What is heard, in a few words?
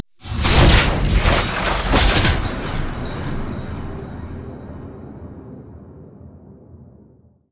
alter lock; LoL; altars